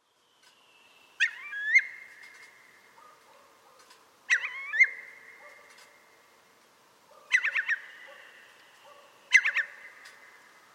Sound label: alarm